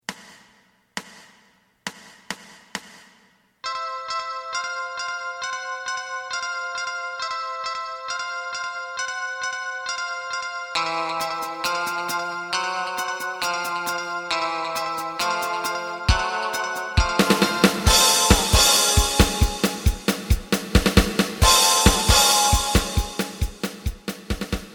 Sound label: bass